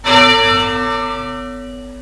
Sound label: Bell; OldBells